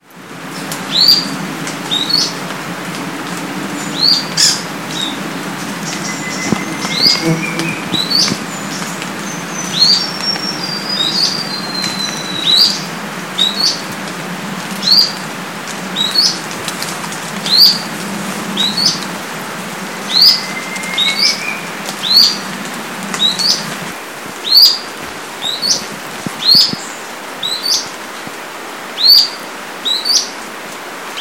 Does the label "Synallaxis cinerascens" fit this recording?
Yes